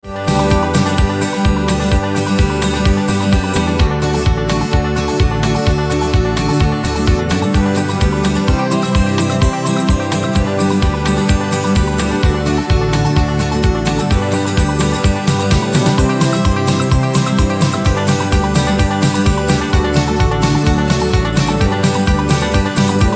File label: Karaoke